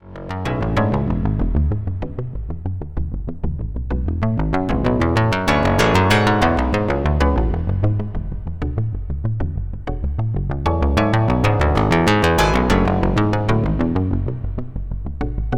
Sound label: bass and chord dynamics, 32 Voice String Synth Eurorack